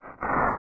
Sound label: Flare Dancer Turn